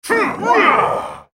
ultimate effort; Brand

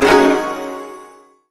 guqin basic attack; LoL; guqin sona

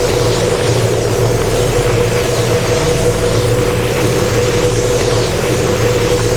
champion channel loop; odin

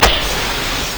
edge hit; LoL; xerath base

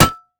basic attacks; Dr. Mundo; TPA Mundo

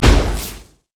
steam golem static field hit; LoL; blitzcrank

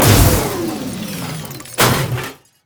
death; rumble

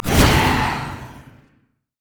basic attack; nidalee

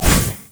fracture consume; LoL; skarner

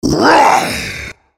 spell cast effort, en US; Rengar

